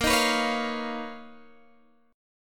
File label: B Major Sharp 11th A sharp